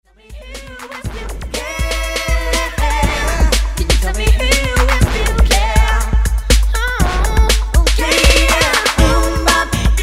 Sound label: bass mix radio version